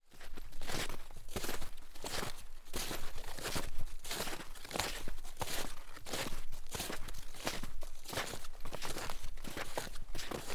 Duo Snowshoe Walking

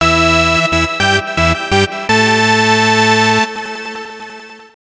Victory Sting

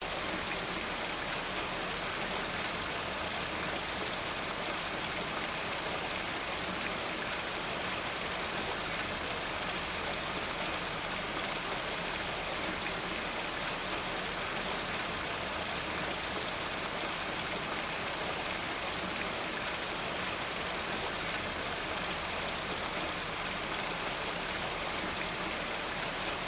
Rainy Atmosphere

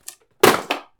Brittle Wooden Box Landing On Floor

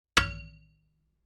Metallic Clang

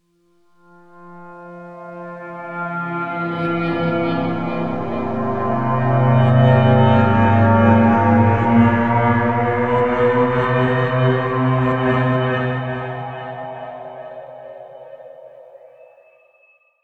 Ghost Appear

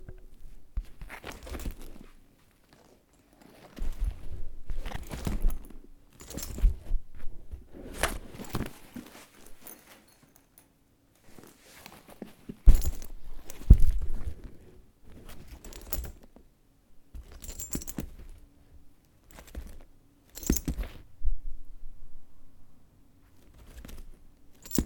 Picking Up A Camera Bag

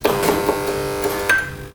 Neon Blink

